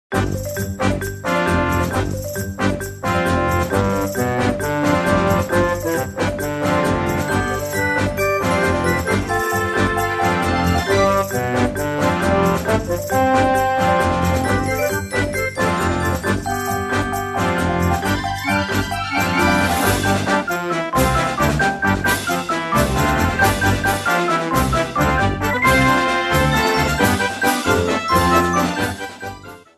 theme

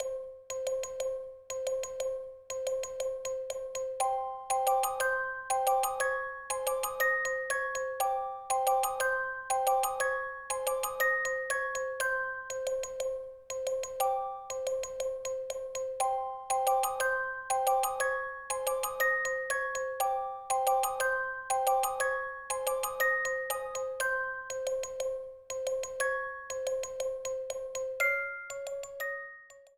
Airship Theme Night; SMM2; SMB

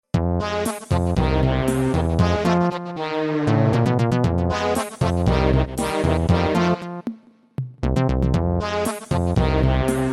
Loser; MP3; Game Guy; Mario Party 3

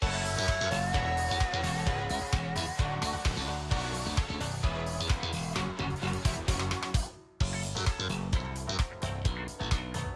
Autumn Mountain Battle Thinking

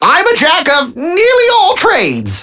Alarm Clock

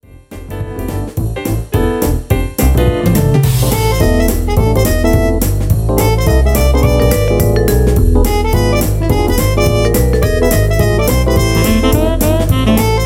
Palm Tree Groove

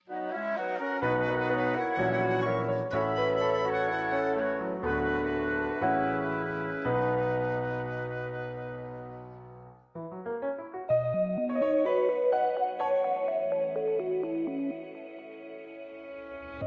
Game Over

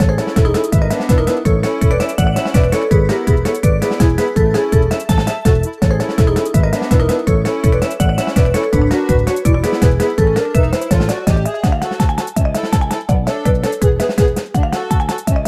Happy Noisy Crowds, theme

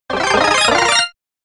The "Power Up" fanfare; SM64DS

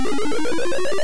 Vine; SMB

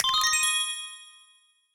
Menu Save; SM3DL

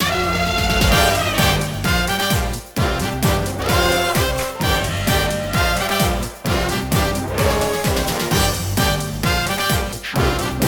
Instrumental Theme

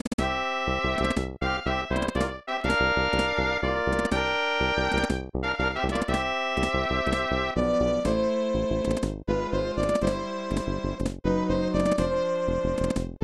Ending; SMAS; SMB2

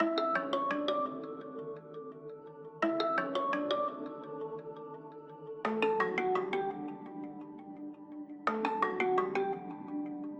Graffiti Underground Lead

